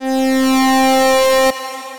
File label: Wide Poly Brass, Synth Lead